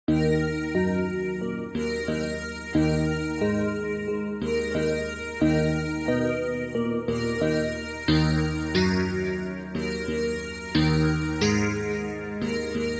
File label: calm; Cursed Gem